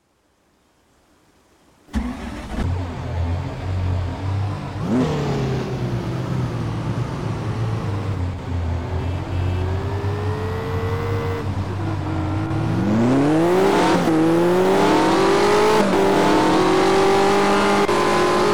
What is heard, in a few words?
ferrari 250 gto